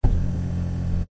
vehicles suv electric seat adjust motor whir nissan patrol